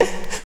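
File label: hum hiccup; AMG - Now CD-ROM (Roland); Soun'Bwoy!; Ragga Samples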